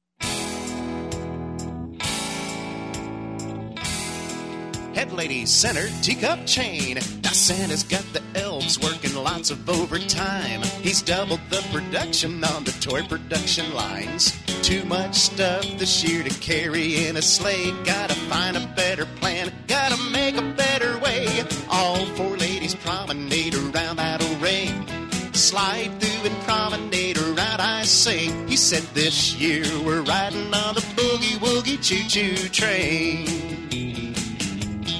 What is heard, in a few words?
vocal, square dance music